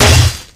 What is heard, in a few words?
hit world; 3rd degree; tf